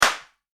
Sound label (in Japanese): クラッカー